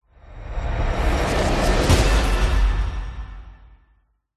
sound design cinematic riser into hit dramatic hard hitting tense; zapsplat